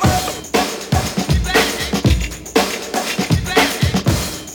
BOUNCE LOOP, BREAKBEATS

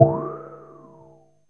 ANALOG BELL; ANALOG BELLS; Ambient; Zero-G; Ambient 1

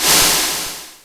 brush roll s, Jazz Brush

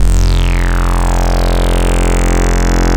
REZ SYNC, ARP Synths; E-MU Formula 4000 Series Vol. 3; Analog Odyssey

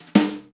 New Brush Snare Phrase; Snares

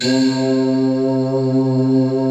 SYNTH PAD

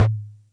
Music Percussion Computer Tom, Drum Machines; Atlantex MPC-1

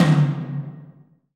TOM TOM, Roland

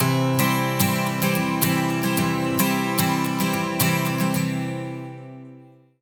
110 MAJ 12 STR, Acoustic Guitar Loops

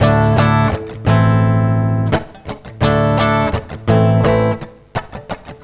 CLEAN, CLEAN GTR

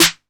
808 snare; SNARES; Wizoo - Platinum 24 Electronic

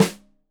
Rim Shot Stereo, drums; Deeply Sampled Drums

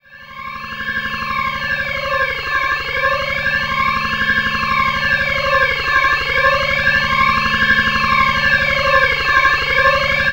D+B; Best Service; XXL Production Effects; DANCE; Sample CDs

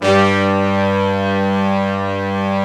FULLBRS G2, Synth City; FULLBRASS

